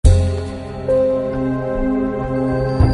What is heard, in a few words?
melody